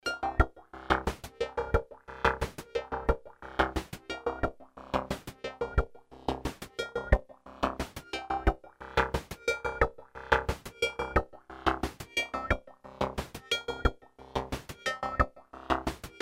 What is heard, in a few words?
Groove